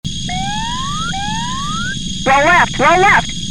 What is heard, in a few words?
Siren Roll Left